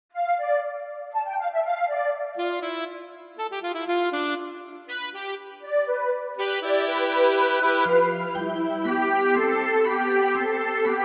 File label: MIDI ditty; spellman studio